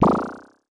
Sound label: synth boing